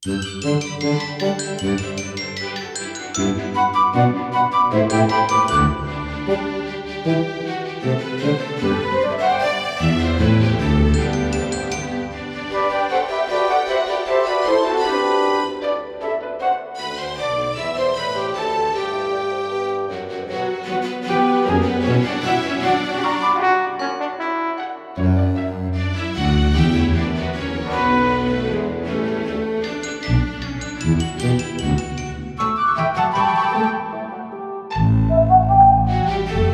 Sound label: happy spring; mixkit